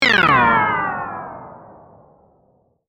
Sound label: Noise Stingers